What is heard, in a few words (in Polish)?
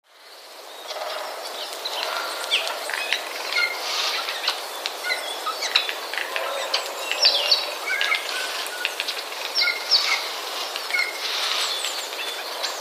szczebiot sojek